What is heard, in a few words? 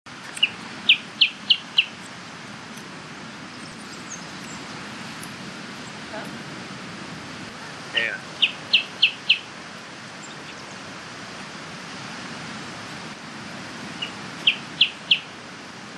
Band tailed hornero